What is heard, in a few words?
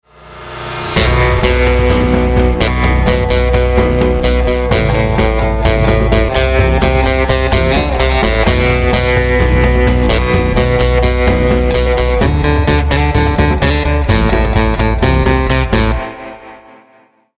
start round, music